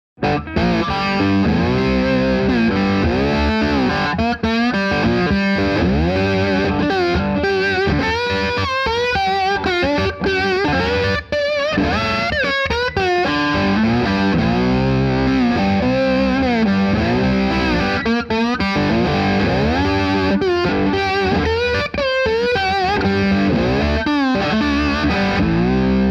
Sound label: slide high gain